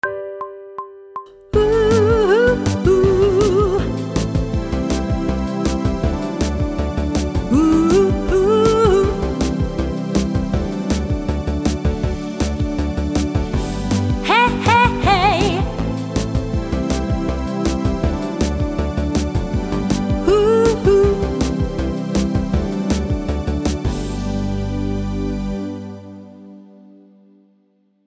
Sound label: Technical Work Riffs G major (with vocal), pop vocals; Grade 1